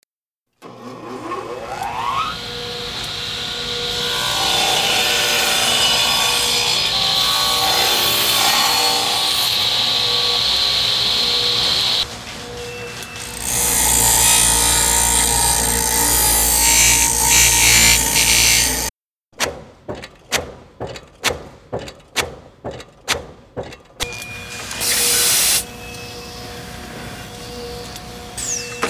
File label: instrustrial sounds